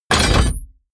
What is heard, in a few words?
sci-fi plasma cannon reload